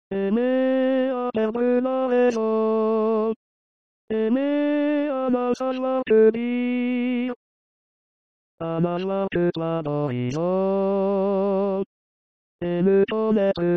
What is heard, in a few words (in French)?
tenor